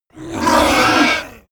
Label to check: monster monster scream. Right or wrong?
Right